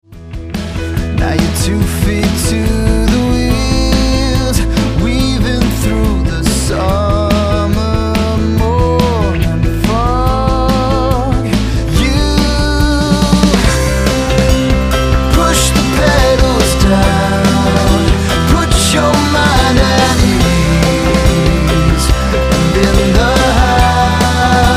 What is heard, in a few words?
Ride